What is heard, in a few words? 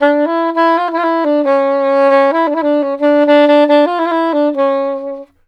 SAX, Balkanish Traditions